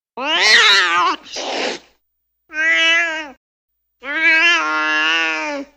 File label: the sound where the cat growls and hisses; صدای گربه